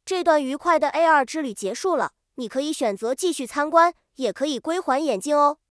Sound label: ending